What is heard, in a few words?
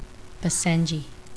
Basenji